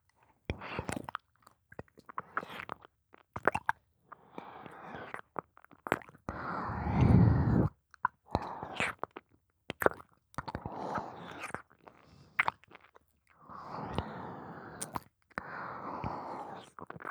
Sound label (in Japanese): 耳舐め